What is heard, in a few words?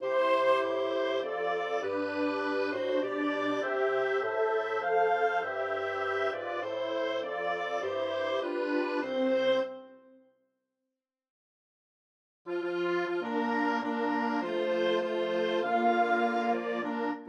National Anthems